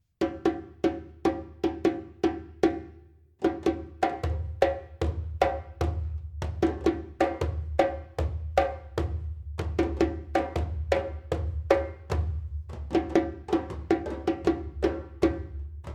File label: djembe a djembe